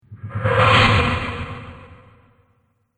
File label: Ghost Whoosh; Ghost Sound Effects; horror